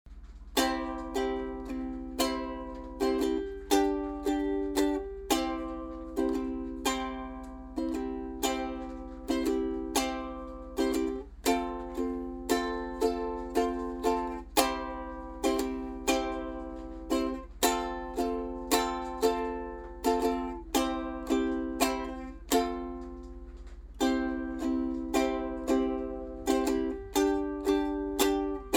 sad songs

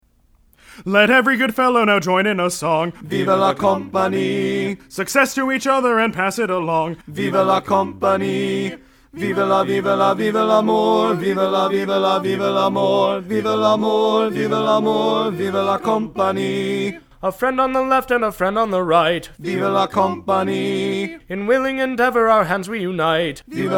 Bari loud

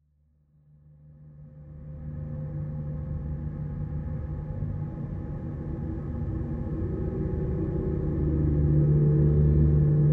Bowed

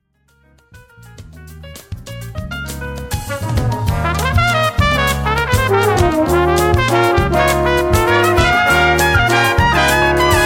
Jingle Bells

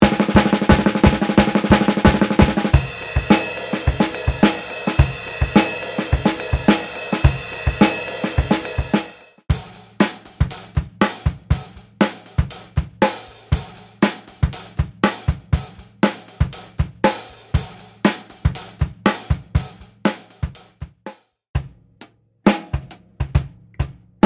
Vault loops raw loops

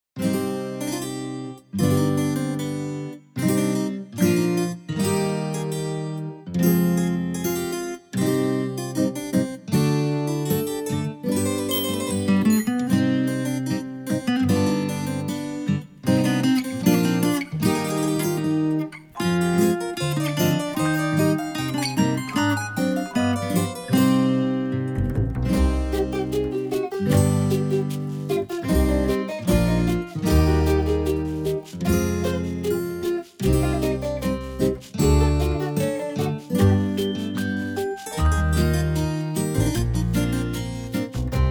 underscore; MELODY episode underscore; KID'S TV

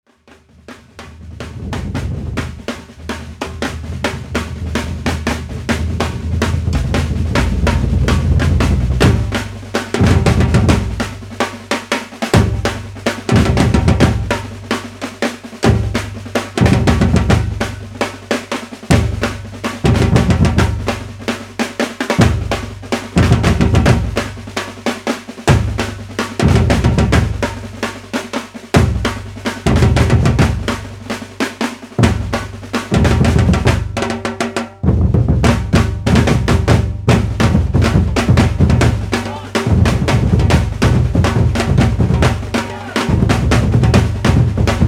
Samba Reggae

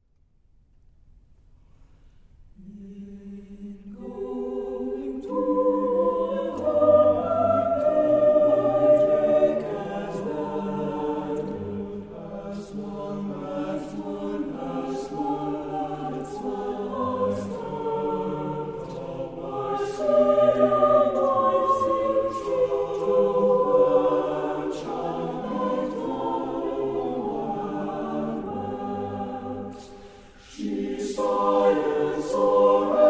Chorale; Valley Chamber Chorale; O Sing Joyfully

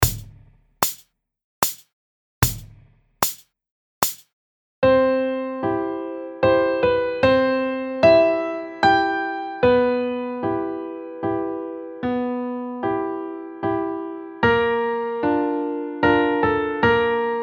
waltz in C

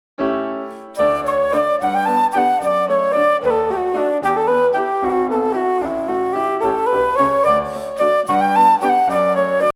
Open Treble Jigs @74

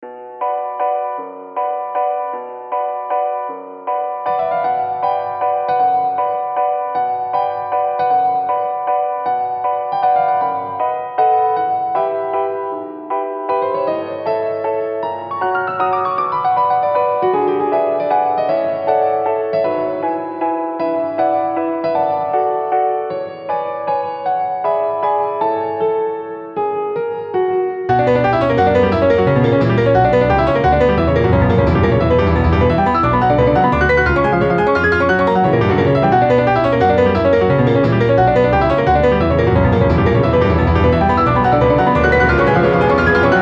Waltz

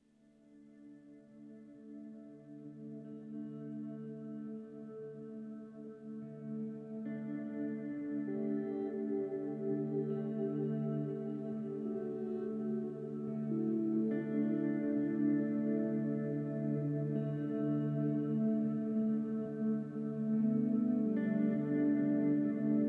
Ambient, Space-y, Dreamy; Whale Calling; Hopeful

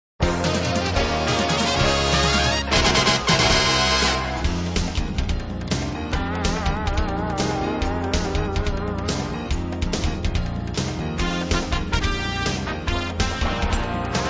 70s action theme

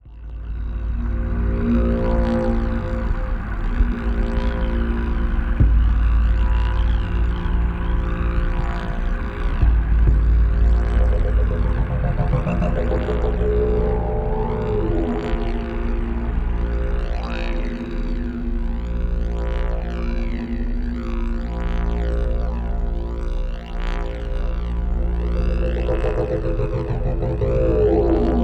Sea Cave

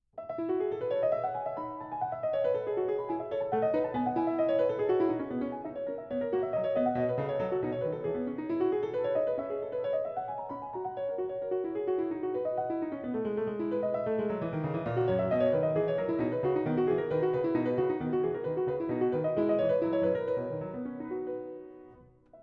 French Suite No.6 Courante; J.S. Bach; French Suite No.6, BMV 817

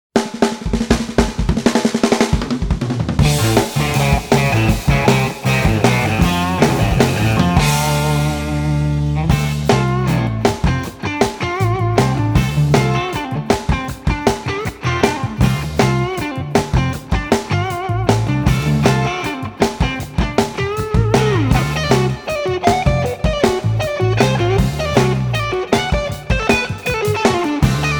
Groove

